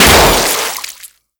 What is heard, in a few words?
Balloon Pop; Festivities; Warframe; Follie